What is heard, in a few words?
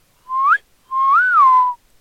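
whistle calling a cat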